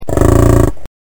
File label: bison